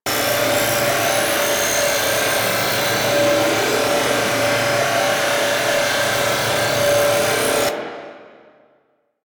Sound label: Energy Strike Loop; Warframe; Ambience; Rail Jack; Venus Region